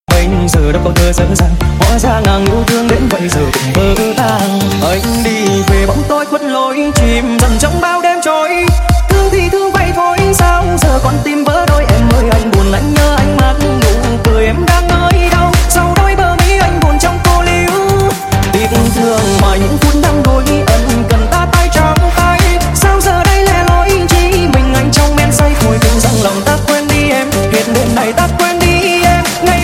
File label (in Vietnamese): Nhac Chuong Remix